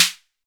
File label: Snare